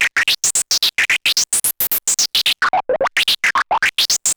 MiniBrute 110BPM, Gated Seq Noise; noise hiss crackle

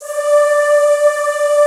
ZZZ D4, Classical Choir; Best Service; Extended Classical Choir

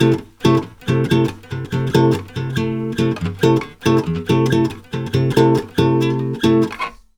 BOSSA C; USB Soundscan; AKAI; Brazil Bossa

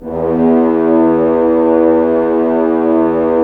Horns; Roland - LA Composer vol. 4

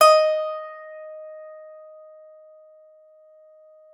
PSALTER D#5; Xsample VOL-8

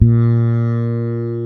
Fretless Bass

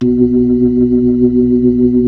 B-3

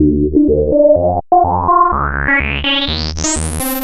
Sicklectro Bass; Sonic Foundry (Sony Creative Software); Underground UK House & Electro

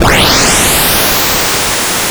C64 Noise Rocket

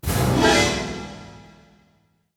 HIT, orchestral; Orchestral Loops; FULL ORCH ACNT

